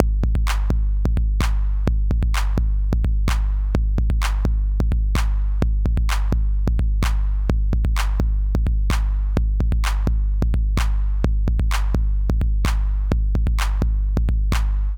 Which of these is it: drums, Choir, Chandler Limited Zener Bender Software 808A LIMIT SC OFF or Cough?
Chandler Limited Zener Bender Software 808A LIMIT SC OFF